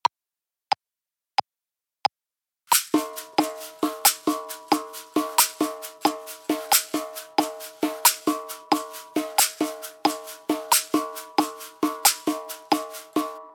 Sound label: Caxixi; shakers